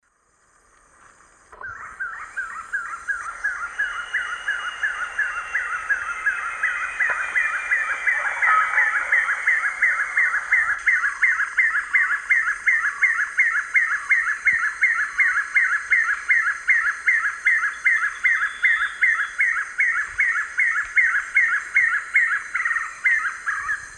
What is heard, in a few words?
Monasa nigrifrons, group song; S. America; Eastern Lowlands